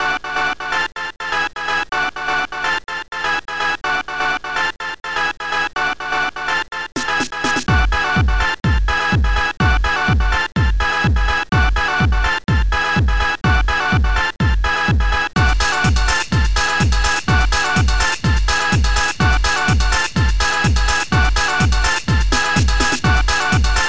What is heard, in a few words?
TECHNO